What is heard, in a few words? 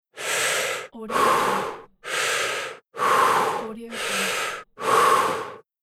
heavy breathing through mouth